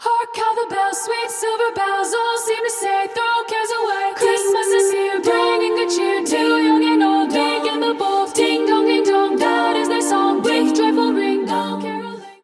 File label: carol bells; christmas; cstrike